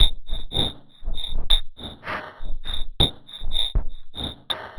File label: perc 100 scrape, Percussive Loops 100 bpm; Sample Magic - Transmission-X